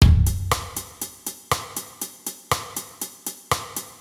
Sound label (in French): Rythme